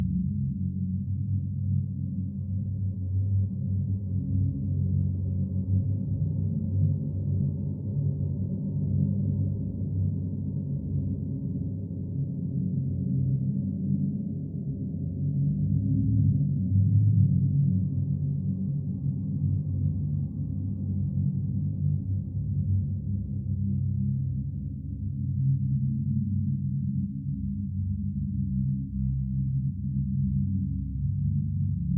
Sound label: bar room ambient; Hellsplit Arena